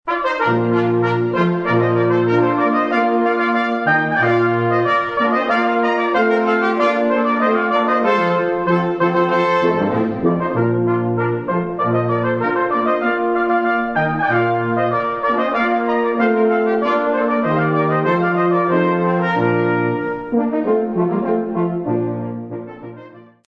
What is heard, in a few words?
rondeau, brass